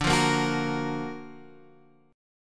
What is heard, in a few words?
Gb Augmented Major 7th D